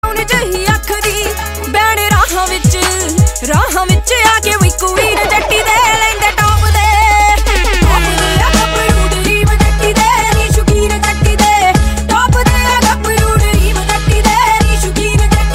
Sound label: ringtone